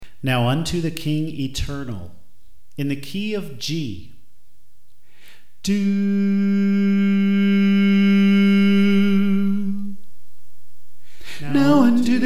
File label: Tenor